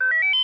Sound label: BEEP; mobilenavigator